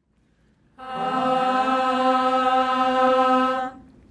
Group Singing C Note "ah" Vowel

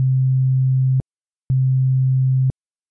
single sine

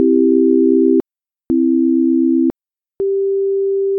multi sin

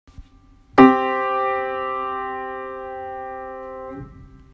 Perfect Fifth

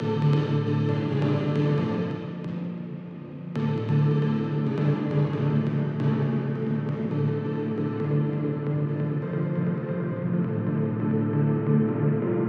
bass echo

